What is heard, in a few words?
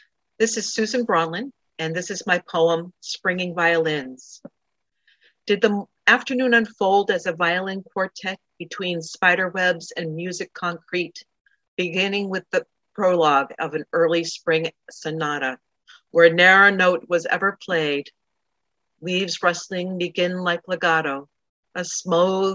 springing violins; Webster’s Woods